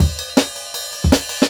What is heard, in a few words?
Jungle Breaks; Original Jungle Breaks